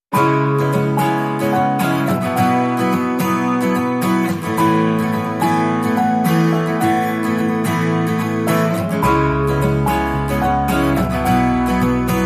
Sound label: Positive Motion, No Drums